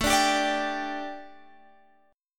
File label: D Suspended 4th A, guitar